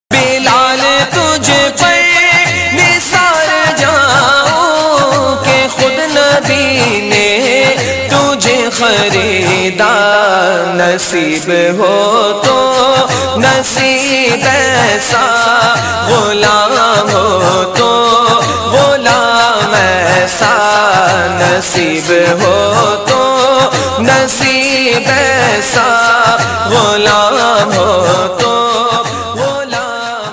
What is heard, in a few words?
Islamic Naat Ringtone